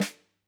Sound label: Snr, drum